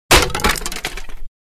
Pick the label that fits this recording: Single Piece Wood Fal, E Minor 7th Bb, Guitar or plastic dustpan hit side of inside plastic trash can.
Single Piece Wood Fal